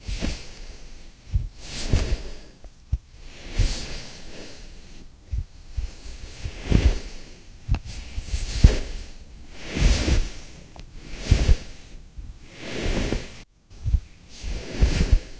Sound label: muffled clothing movement; character movement